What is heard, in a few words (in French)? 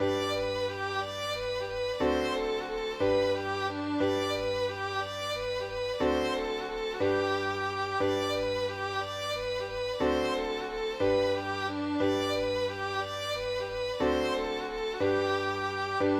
mazurka de la fiole